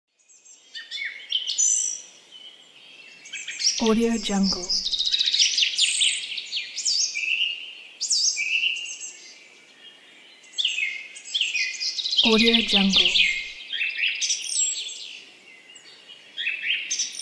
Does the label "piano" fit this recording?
No